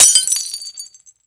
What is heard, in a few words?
grenade glass break; zombie plague